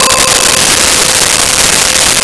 New Age Pad C5; Nintendo; July 2020 Gigaleak

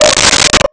Cuica; Nintendo; July 2020 Gigaleak

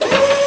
lift; Scene SE; Nintendo; July 2020 Gigaleak

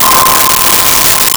Pan Flute2 C5; Nintendo; July 2020 Gigaleak

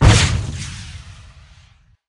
rocket, fire

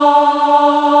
ah voice, d4; BGM; Nintendo; July 2020 Gigaleak

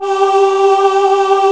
Choir g4; BGM; Nintendo; July 2020 Gigaleak

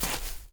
grass run, footsteps; chief

